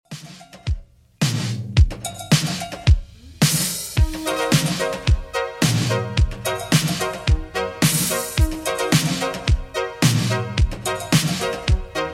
80s Redrum 109bpm

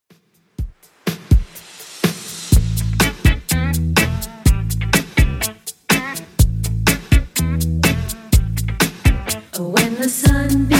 80s Redrum 124bpm

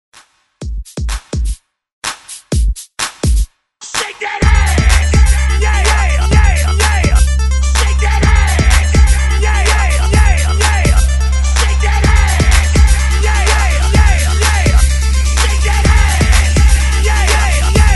126 bpm; DJDOLLS

